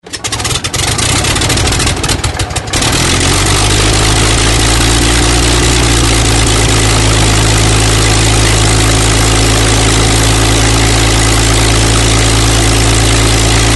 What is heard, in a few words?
p40 start, Aircraft sound record